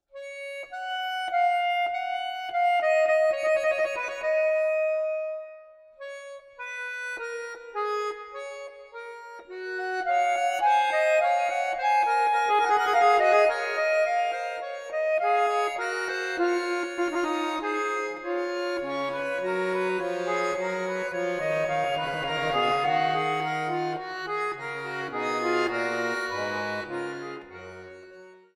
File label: Fugue in F sharp major, accordion